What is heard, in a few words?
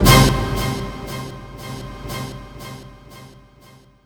ORCHFX, orchestra, Asian Traditions; USB Soundscan vol.60; AKAI